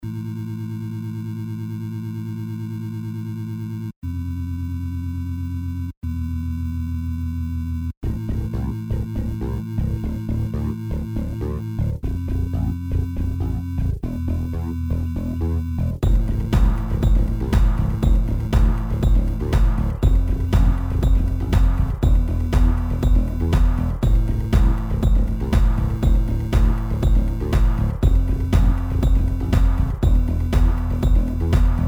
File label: lofi electro funk